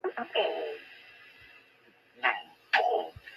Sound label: angry mole